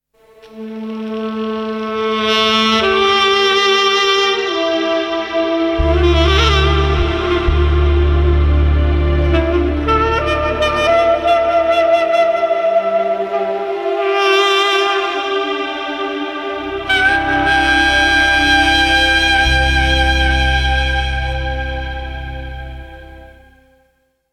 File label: Sad II